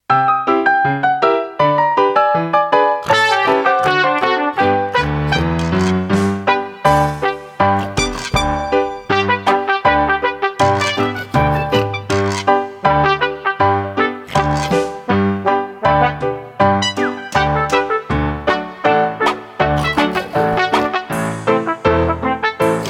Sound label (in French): Le petit cochon, Playback; S-Active éditions